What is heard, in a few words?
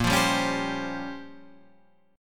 A sharp Minor 6th Add 9th A sharp, Guitar chord; A#m6add9 Chord {6 8 5 6 8 8} strummed